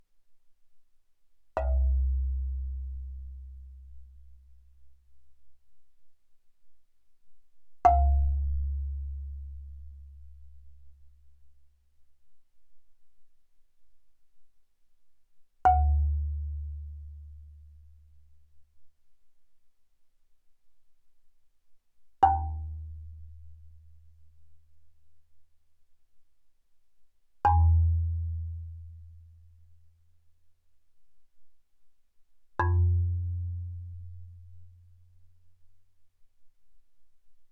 Marimba yarn ff C2 B2; Percussion

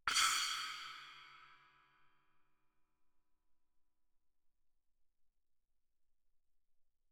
splash mallet ff, suspended cymbals; Percussion

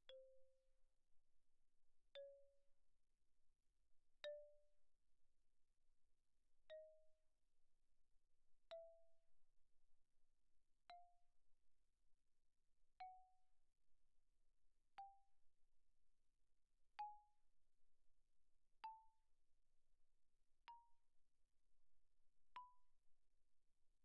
xylophone rosewood pp C5 B5; Percussion

